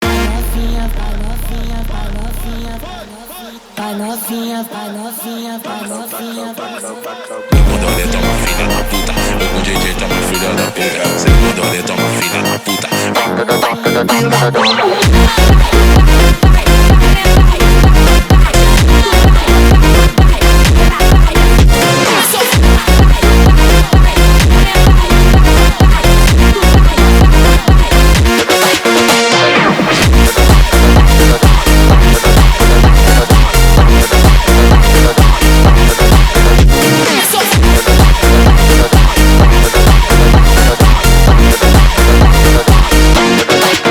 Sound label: baile bounce brazilian phonk